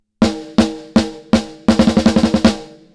snare

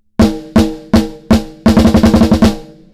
BASS 10 60; Gainstation

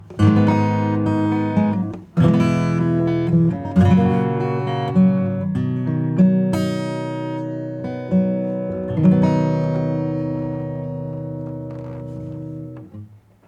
acoustic; Analog Pro

